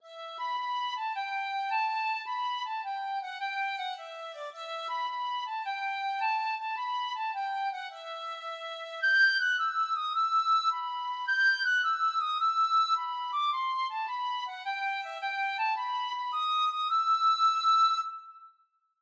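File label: penny whistle, tin whistle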